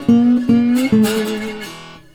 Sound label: Blues Guitar Samples; ILIO - Fretworks